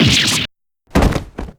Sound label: faint; rayfa